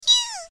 Cry "Kyuu"

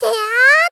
Moe Attack "Teyaaa"

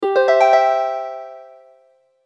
System Notice

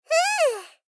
Moe Rest Fuuu; Rei Yumesaki

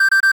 System pipipi middle

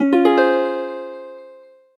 System Notice

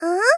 Moe Perceive "Hmm"; Rei Yumesaki

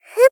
Gentle Attack "Fu"

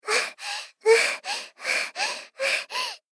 Pant

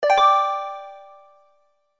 System Accent

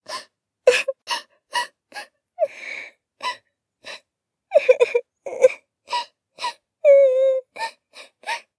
Cry Loop

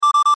System pipipi low

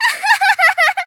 Laugh "Ahahahahaha"

